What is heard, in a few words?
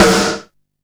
Snare, Drum & Bass